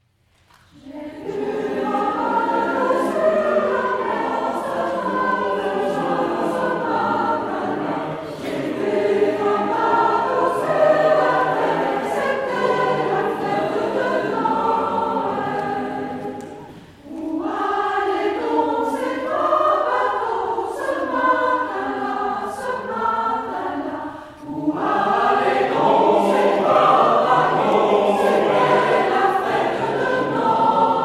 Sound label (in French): Noël anglais